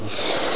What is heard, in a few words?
balloon inflate; Amiga Games Extra 1996 #6; harrysballoons; sonix